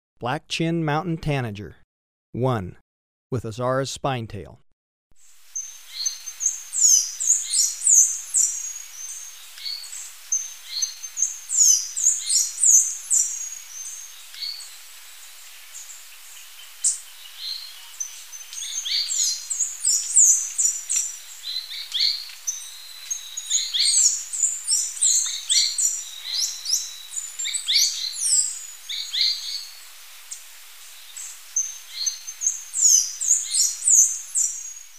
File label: Black-chinned Mountain-Tanager, Birds of Ecuador; S. America